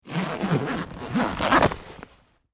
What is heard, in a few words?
Sports Duffle Bag Zipper Open Long; Zippers; Objects